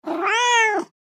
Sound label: Playful kitten meow cat meowing; animal